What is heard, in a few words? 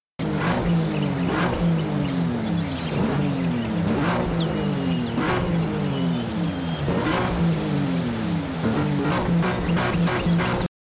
BMW Revving